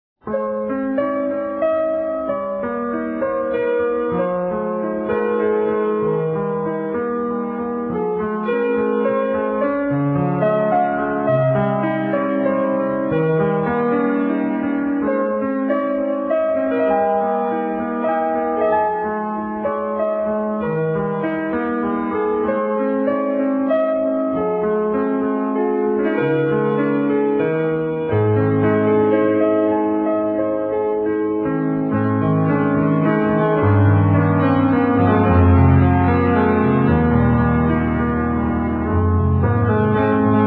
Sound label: musicbox